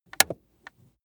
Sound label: plastic plate crevice click